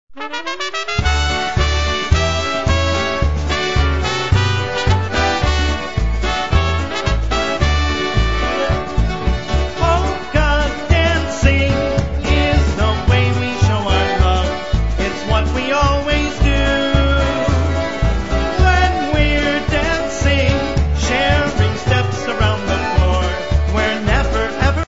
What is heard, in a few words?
polka dancing polka